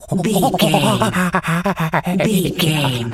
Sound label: monkey chatter